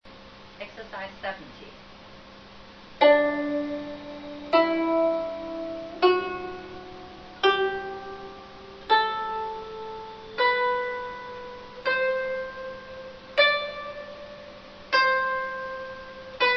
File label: zheng